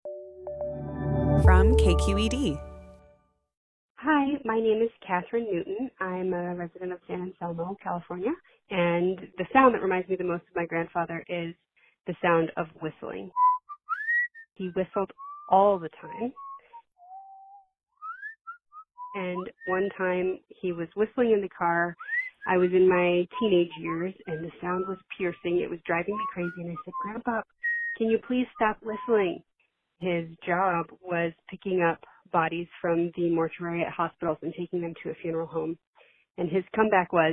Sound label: Whistling